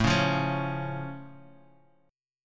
A Augmented A down; strummed; JGuitar

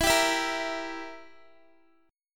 E Diminished down Guitar; strummed

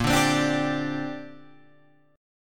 A sharp 6th Add 9th chord, Guitar, Open F tuning; strummed; chordshape; 10,9,12,9,10,9

